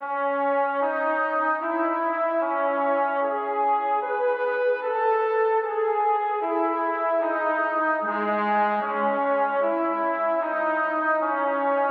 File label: Brass, 150 BPM C# Min; Cymatics; Code Of Honor